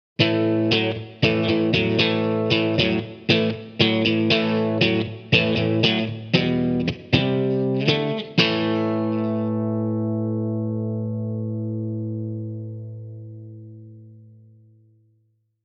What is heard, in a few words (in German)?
Amp pur